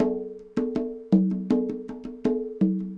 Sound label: cong bongs 80, latin, 80bpm